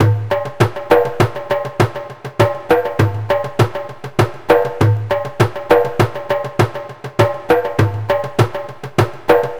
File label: Baladi Tabla, Acoustic Beats; Sonic Foundry (Sony Creative Software); Myths of Technology